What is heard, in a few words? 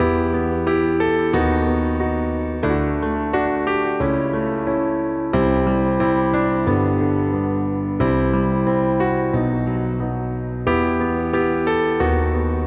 BPM 90 Main Piano Em; Leads; Ghosthack; Comeback